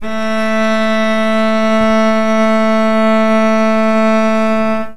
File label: a string open, cello